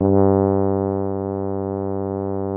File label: BRASS 2 G1, ORCH BRASS; Greytsounds VOL-1